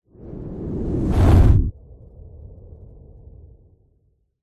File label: sound design whoosh flames fire into airy tail cinematic; zapsplat